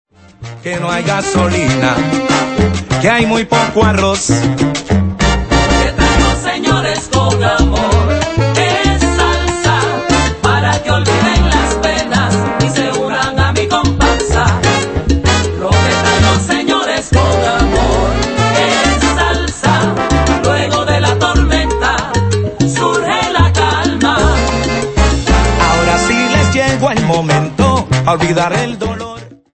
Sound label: Salsa; teaching listening